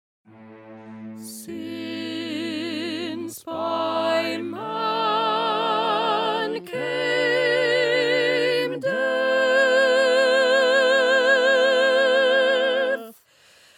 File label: Soprano; Handel; Messiah